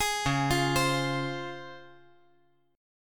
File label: Db Major 7th Db down; arpeggiated; JGuitar